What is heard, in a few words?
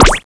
magicmg; cstrike